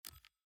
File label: hover